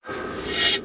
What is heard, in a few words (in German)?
karte eigene karte wird ausgespielt; magic 2014